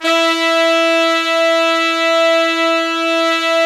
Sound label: BRASS SECTN, Pop & Funk Brass; Best Service ProSamples vol.25; AKAI